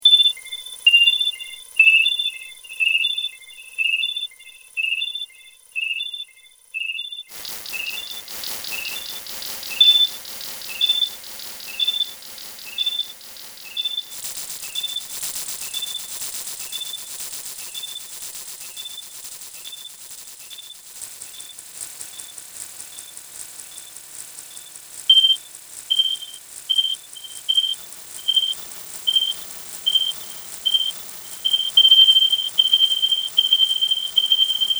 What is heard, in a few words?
sine tone delays